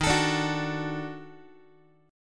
Eb Minor Major 7th Flat 5th Eb down Guitar; EbmM7b5; strummed; JGuitar

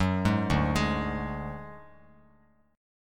D Major Flat 5th D Bass Standard, chord; arpeggiated; JGuitar

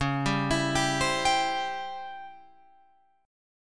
Db Minor Major 7th Flat 5th Db down Guitar Open F; arpeggiated

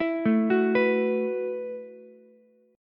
Gb Minor 11th Gb down Ukulele C6; Gbm11; arpeggiated; JGuitar

